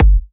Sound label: Bassdrum